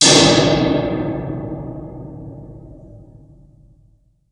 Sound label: metal hit; DoomRTX; ambient; metals